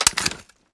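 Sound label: shotgun reload empty fast mag out; reloads; iw8; romeo870; gmod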